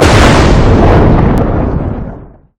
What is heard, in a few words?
thunder; crampman